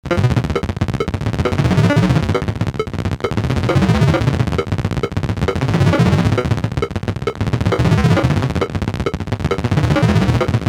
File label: clicks, hard soft synth